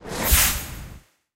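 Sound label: debrief achievement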